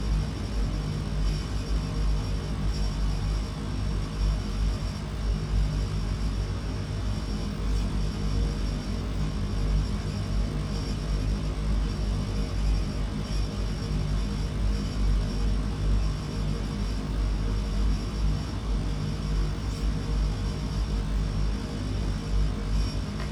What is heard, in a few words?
Machine Room Loop, Sci-Fi Sounds Hum and Ambience